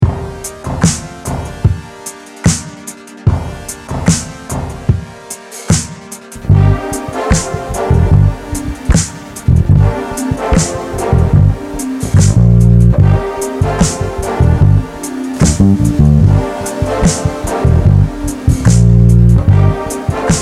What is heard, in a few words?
Second Take bass up; three ways to improve any groove